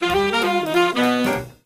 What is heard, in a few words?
Music Effect Jazz Hi, jazz